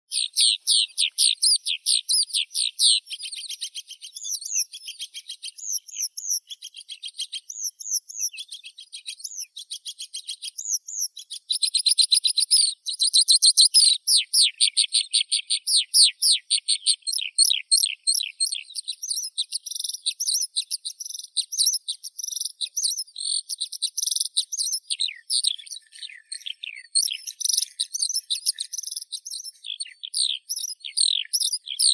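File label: oriental skylark, Alauda gulgula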